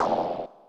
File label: Shell Hit; Super Smash Bros